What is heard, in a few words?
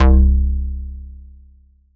bass one shot c